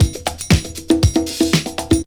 LOP, Drum Loops; Big Fish Audio; Prosonus 500 Drum Loops

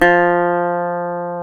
GTR, Dobros, National

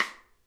snare, drum kits

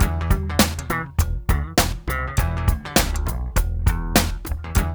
MIX, Funky Ass Loops; East West

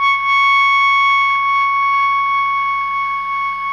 SOPRANO C#5, Saxophones; PP; Greytsounds; Philip Wolfe David Crozier's Saxophones

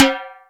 Timb H; Percussion

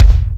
PWR KICK; Crypton - DrumBase5000; GATE KITS

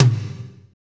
TOM, Drumscapes Roland

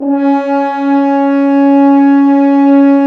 BRS, FHns Dry; Roland L-CD702; F.Horns 2

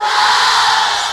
vox special

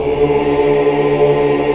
AAH, AAH OCT; Best Service; Hallelujah

